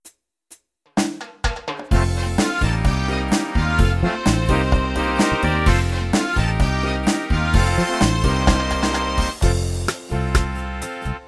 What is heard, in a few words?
Cha Cha, rhythms; mzx500; preview pack 01